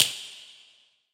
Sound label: upgrader tick